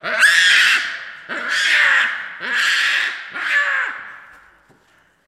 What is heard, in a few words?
Baboon Shrieks X4